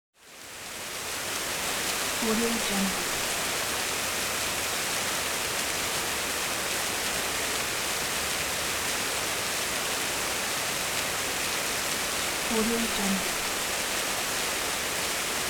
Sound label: fountain